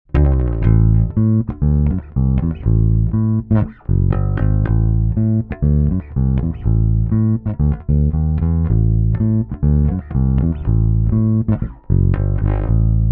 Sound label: Neck Passive